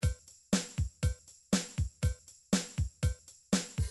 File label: midi drum patterns drums modern